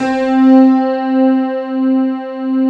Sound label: piano pad C4